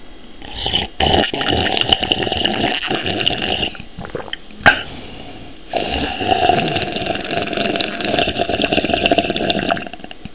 SLURPY

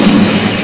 KABOOM

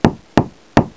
KNOCK

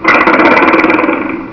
clatter, machines

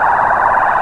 SHIPJET

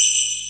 SLAY BEL; PERC

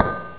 TINK

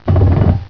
BRR

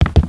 maze step

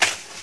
BANGS

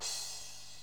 CRASH, DRUMS

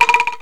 WODSH

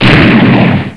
FIRE; AVALON

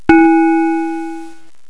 BELL NOT8

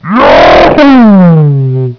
YAHOO

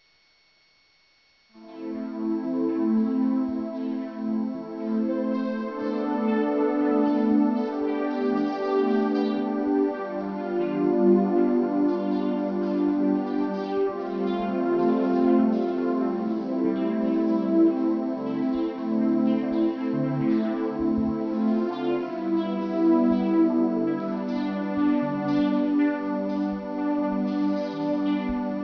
soothing

